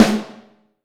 Snare Drum; Q Up Arts; Johnny.C's Vintage Snare Drum; 5.5X14

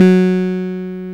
RMI F#4, EP; Keyboards of The 60's and 70's

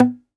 Domra B2 Mute; Multisamples; Digital Sound Factory Planet Earth

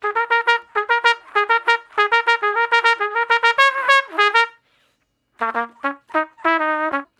Trumpet Straight (Bb); Solos; Crimson Blue and Fabulous Horncraft 4 RnB; Horncraft for R&B

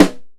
Rim, Drums; PX7; Multisamples; Digital Sound Factory Protean Drums

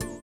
VIBRA; Spectrasonics; Metamorphosis

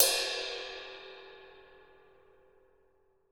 RIDE, Drumscores, KITS MEDIUM; 90s sample CD

